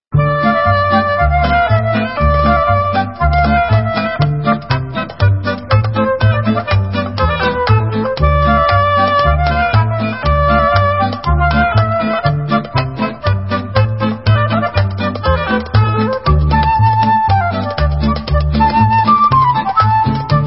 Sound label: Debka, Instrumental; Debka Dalia